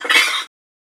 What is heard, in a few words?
Creature Button; moul